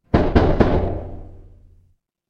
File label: door knock